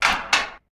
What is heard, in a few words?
Oven Close; Game rip; MiSide